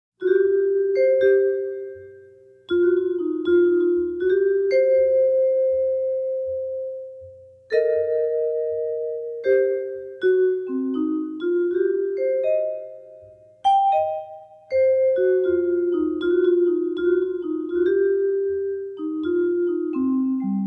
solo vibraphone

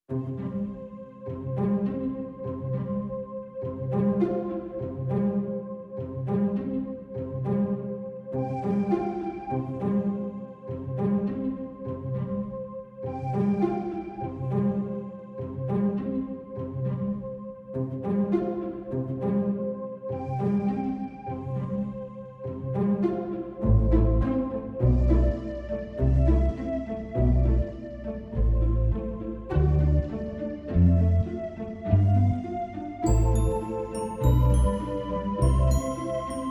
no melody no perc

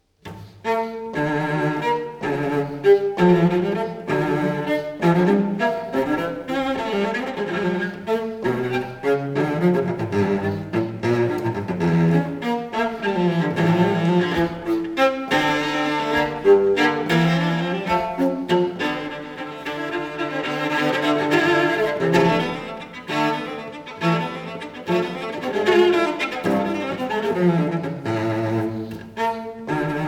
suite for cello solo no 2 in d minor gigue; johann sebastian bach

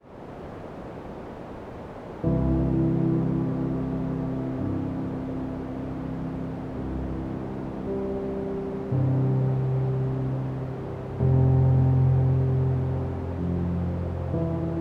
healing piano white noise

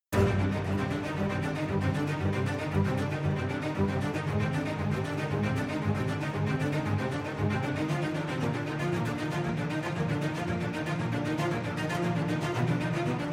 strings

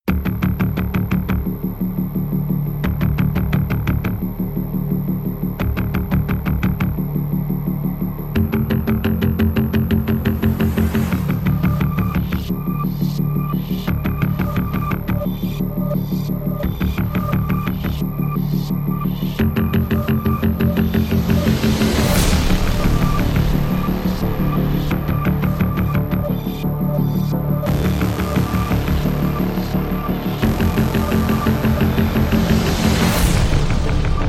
pads and pulses

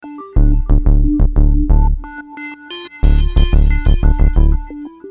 bugle

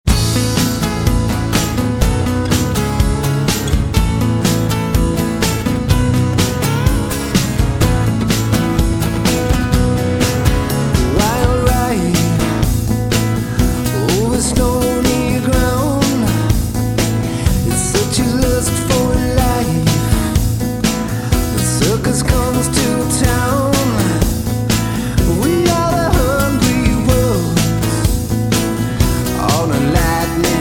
live acoustic

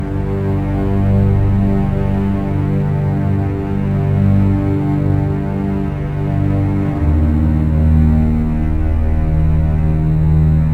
danger drone

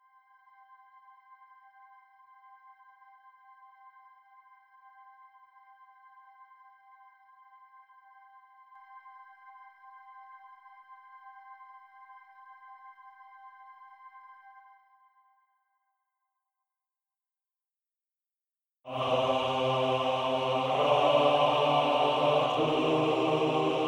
choir stem

